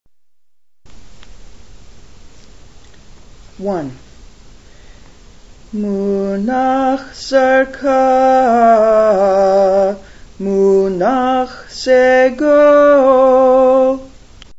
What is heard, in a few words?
munach munach segol, Haftarah Trope